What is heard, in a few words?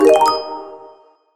upgrade; BattleBuddies